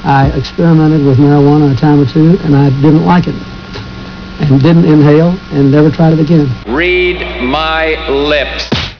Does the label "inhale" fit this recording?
Yes